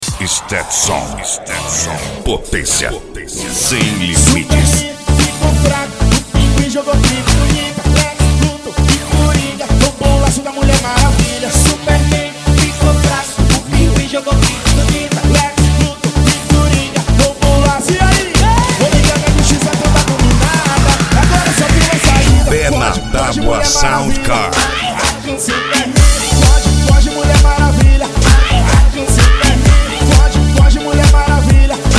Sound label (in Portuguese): sound car carnaval